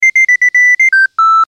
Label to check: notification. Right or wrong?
Right